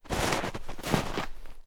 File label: Steps Snow